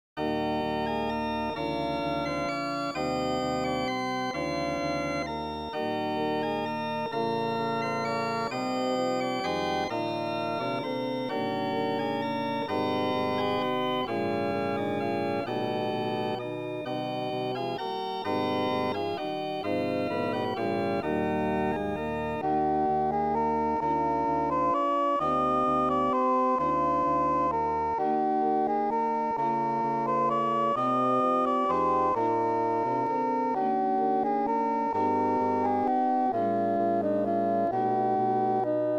Organ Pipe

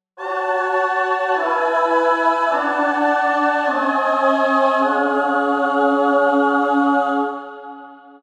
17 prog choir c, 17-ET

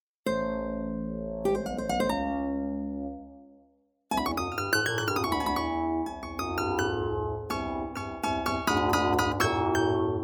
kontakt overtone; harmonic series

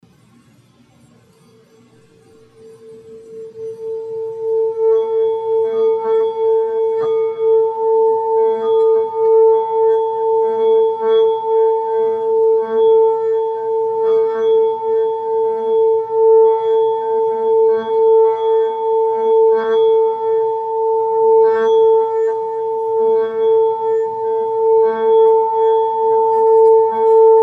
tibetan bell 5 inch

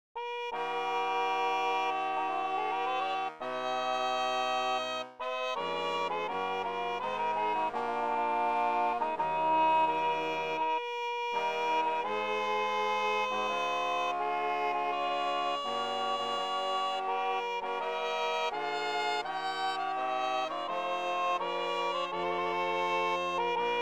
3 sagbutts 2 cornetts; Locke Air

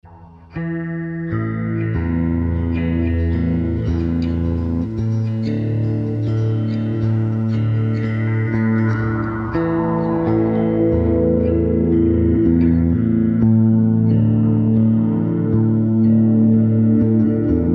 8 string ambience; 12-tet

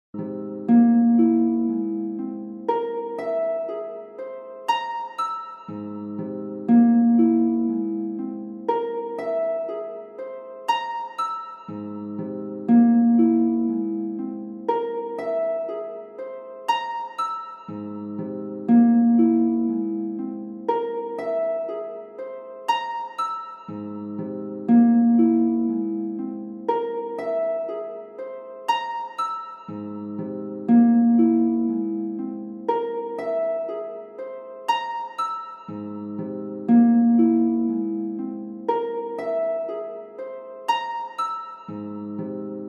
for harp; 12-tet

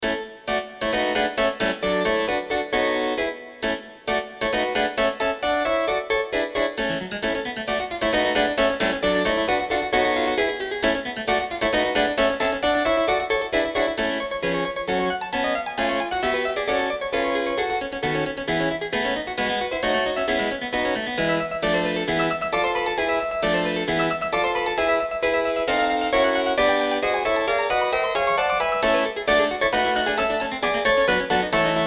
Harpsi 31edo; Nutcraker Ouverture

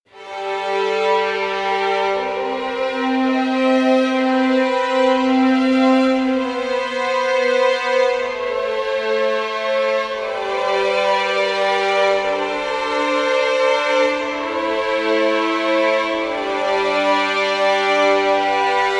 quartertone short study

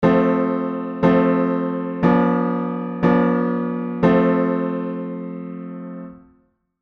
plagal cadence; 16 edo theory; 16-ET

